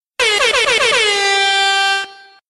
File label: airhorn